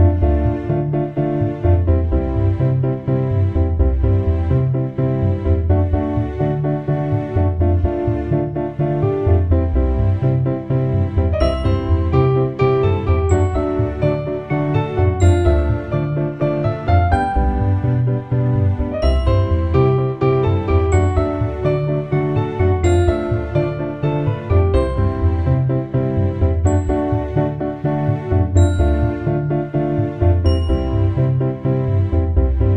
Shore village loop

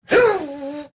Stun

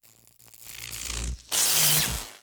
Zap Bug; Hollow Knight

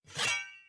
axe spin attack begin; unsighted

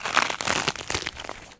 Ice Cracking Melting; Legend of Keepers

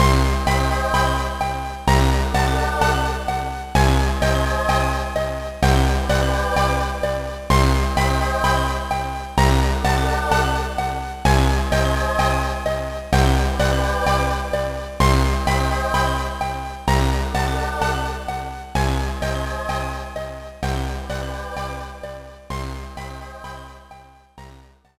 Fanfare Game Over; Sigma Star Saga; GBA

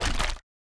Attack

